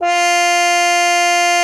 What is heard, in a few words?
cup mute F4; Xsample VOL-3